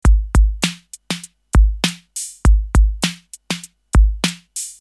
Original Drums; ShapeShifter

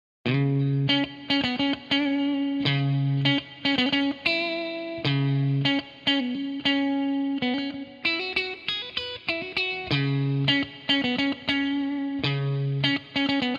les paul wet; chiqui audio

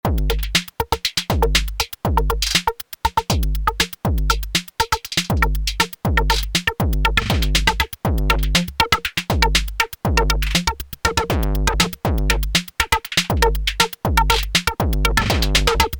drums

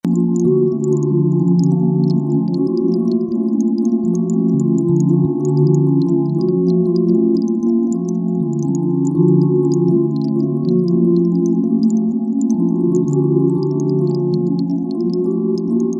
synth grainbrain; grainbrain by xnullx

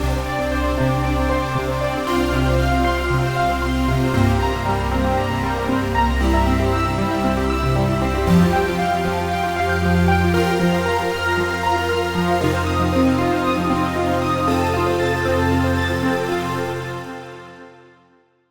Pad n Pluck; Altitude

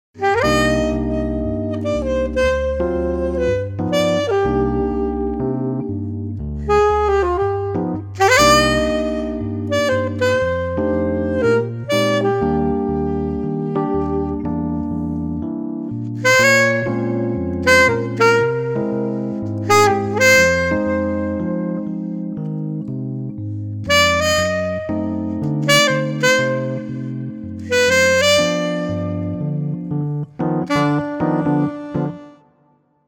sax

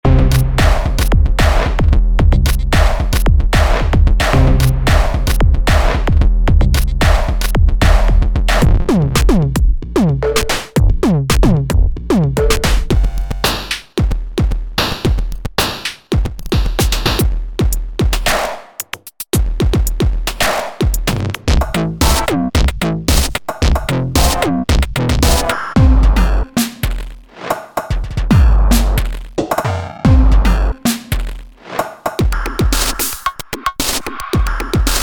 distort ii, playbeat; audiomodern

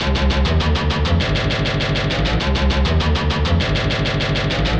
C arp loop; methane and octane; hollywood audio design

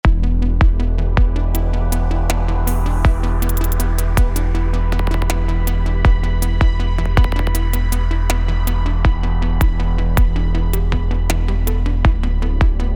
drum machine, percussion; gss drum machine; five ways; percussion workstation; golden screw studio

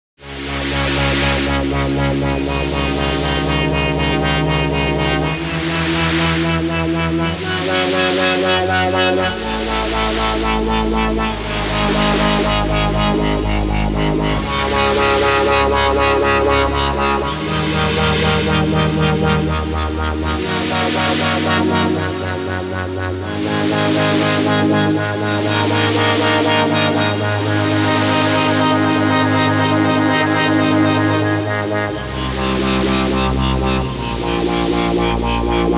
noise wind seq; the digital life

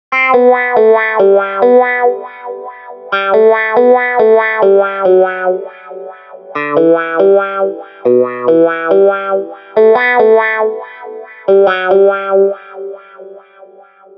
dark 400 hz 1900 hz, wah; e wah wahfx300

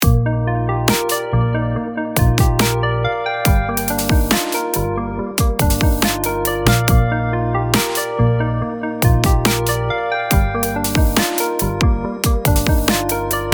soft pipe organ

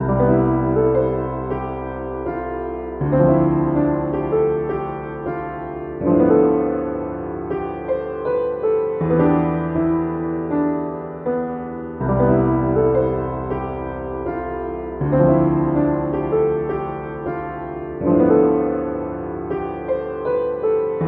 cozy piano; samplescience

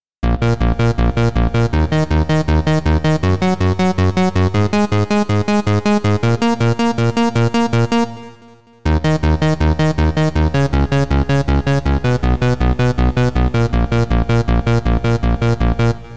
arp straight; the transmitter soundset

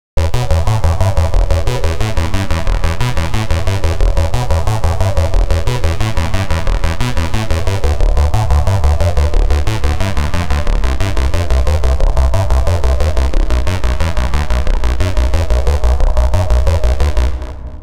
technetium arp; the space soundset

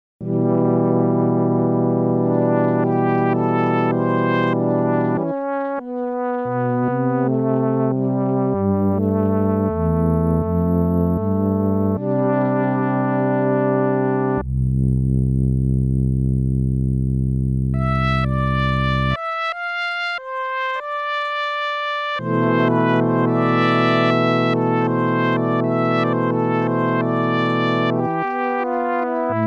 horn

